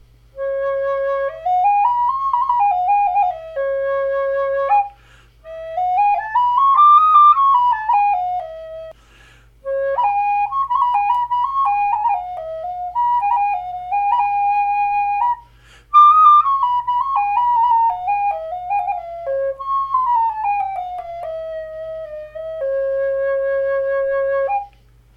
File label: Csharp5, flutes; M-puna